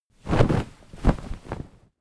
flag medium flap

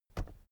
keyboard external space push

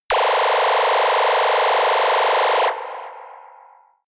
general ufo laser machine gun

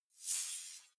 fly by digital shards

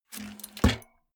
bmx bike bar spin regular

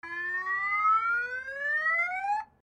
car alarm

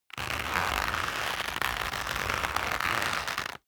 leather creak long medium

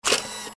machine burst

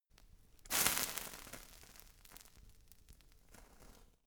fire torch sizzle hard

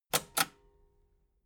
metal button cassette stop fast

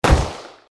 shot

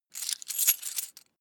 coins big clatter fast

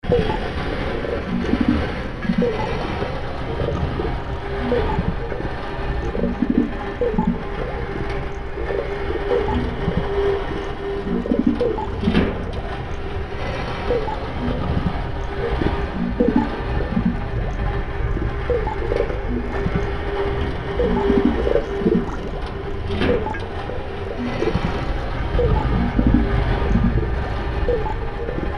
strange bubbles

